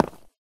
footstep concrete land; ProSoundCollection v1 3 MONO

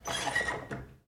SFX Target Down; This Is the Police

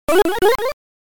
Light-up (SFX)

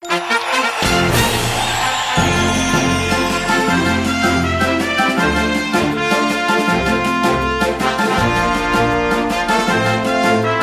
Victory!; Kirby Battle Royale; League Battle

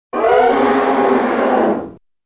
Boss Out

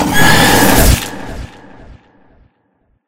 Jumpscare; Huggy; Jumpscare Delay

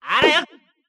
slash; Mystical Ninja Starring Goemon

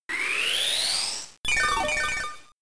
Synthesis; pokemon; gen 3; attack moves

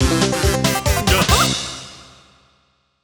Collect Extra Life

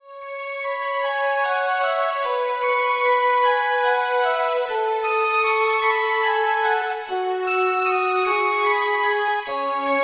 Atmosphere Dungeon; Atmosphere III; Dungeon Level 2; Advanced Dungeons & Dragons Slayer; 3DO

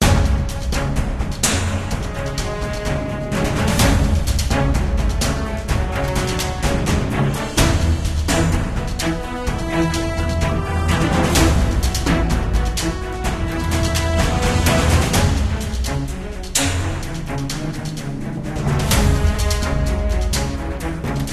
Music Battle; Brave Fighter Demon Revenge; Android

